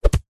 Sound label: impacts swhoosh into thud